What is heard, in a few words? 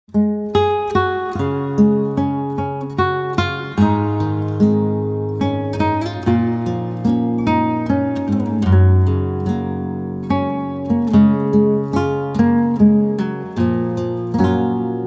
Peaceful Guitar